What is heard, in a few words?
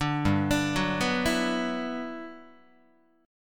G Major 13th, Guitar; arpeggiated; JGuitar